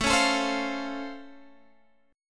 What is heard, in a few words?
C sharp Augmented Major 7th A, chord